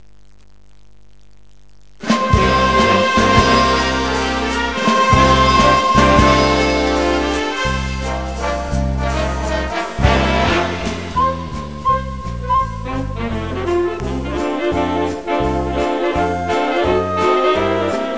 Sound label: swinging sax